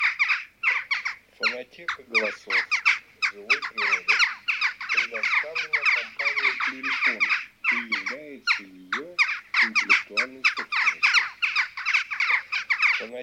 Галка Taccola

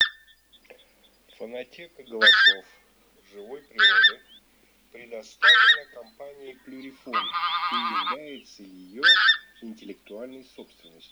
Гуменник к клюв Oche Zampe Rosa

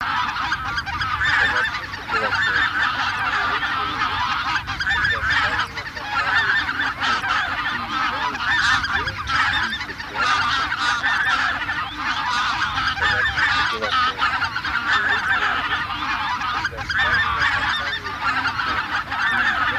Гусиный базар

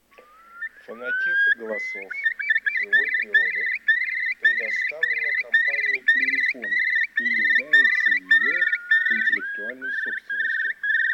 Кроншнеп большой Chiurlo Maggiore; plurifon

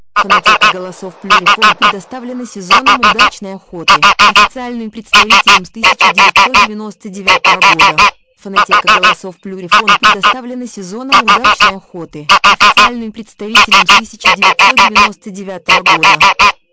серый гусь; plurifon

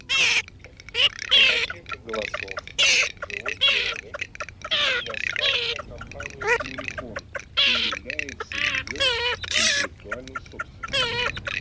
Чирок-трескунок Marzaiola; plurifon

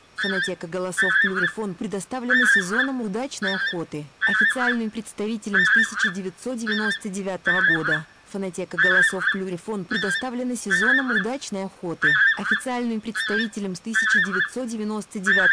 гуменник; plurifon

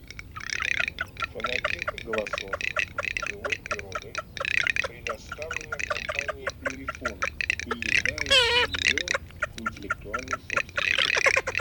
Чирок-трескунок Marzaiola; plurifon

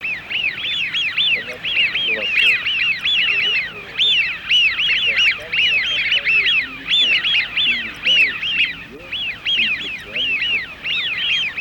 Свиязь Fischione; plurifon

